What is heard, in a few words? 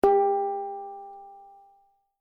Hang G3; Acoustique